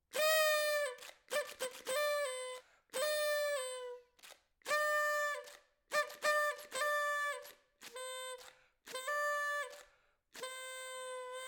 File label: Party Blowers